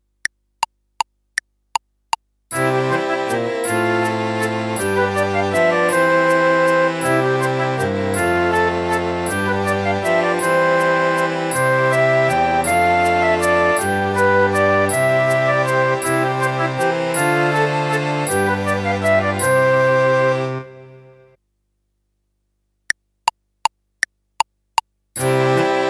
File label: Folk Song, fife